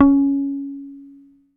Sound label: HOFNER SUS C#4, Bass; Sampleheads; Will Lee Bass Library